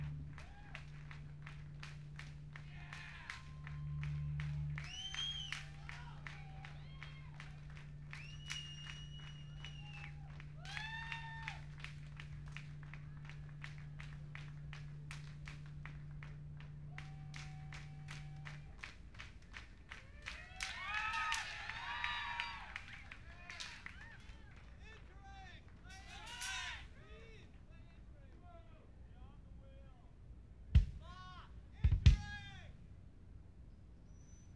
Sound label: encore break; San Francisco, CA